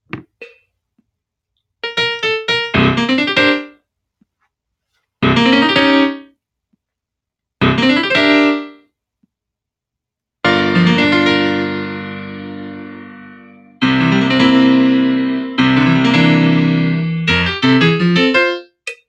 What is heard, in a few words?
accompaniment